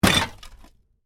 Plastic container full of bottles and cans: short hit; Impact; Miscellaneous Impact Sound Effects; Stuart Duffield